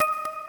crystal scatter; Lucky Fortune; Wazdan

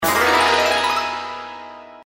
expand; Princess Wencheng

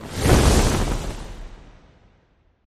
light sweep; games; Aztec Gold Megaways

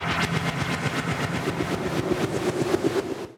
long spin; Great Book Of Magic Deluxe; Wazdan

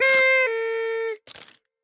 win trumpet; Hot Party Deluxe; Wazdan

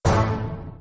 bonus; Sheriff Of Nottingham

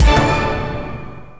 stop bonus; Fire Queen

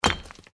reelspin click; California Gold Rush

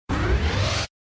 UI INFO; Unicorn

